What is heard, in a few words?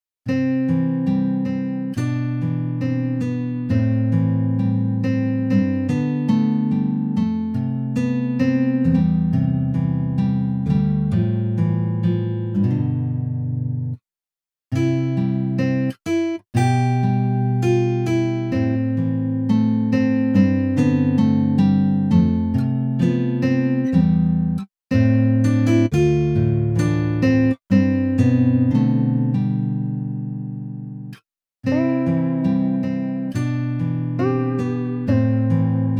country